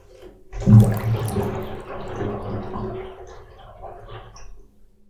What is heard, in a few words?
drain bathtub, 風呂の栓を抜く; wetarea